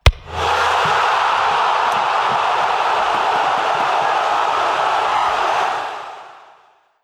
Goal

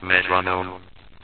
Metronome

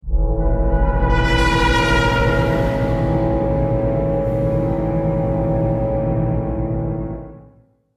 Combat Heavy Outro; Darksiders

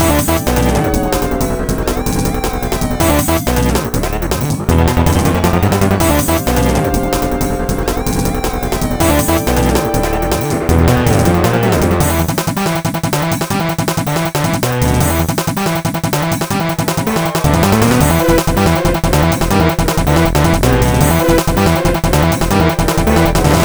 Block Vs BGM; 1500 ds spirits vol. 03 block kuzushi 2007 nds

